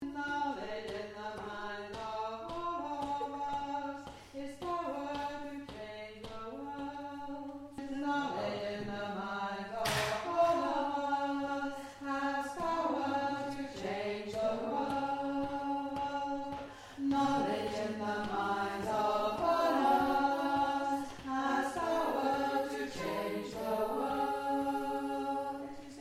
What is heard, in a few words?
Alto